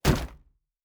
Shield Wood; Fantasy Sounds Bundle; Medieval Combat Sounds

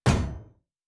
Shield Metal

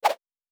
Whoosh; Fantasy Sounds Bundle

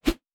Whoosh; Fantasy Sounds Bundle

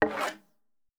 Weapon Draw Wood

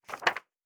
Book Page; Fantasy Sounds Bundle

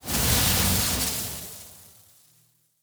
Lightning Spell, Magic Sounds; Fantasy Sounds Bundle

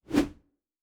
Whoosh; Fantasy Sounds Bundle

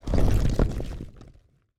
Stone Move; Fantasy Sounds Bundle; Craft

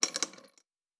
Chain; Fantasy Sounds Bundle; Craft

